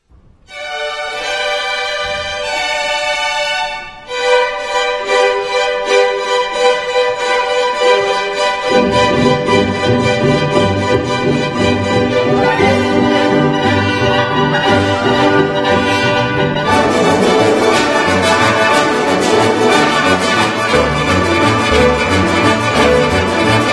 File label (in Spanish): Sones de mariachi; Blas Galindo